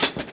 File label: CHH, Dance Drums